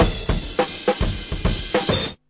104 bpm, fill you in with crashe, drum loops and breaks